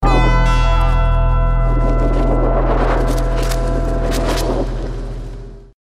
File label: wind mix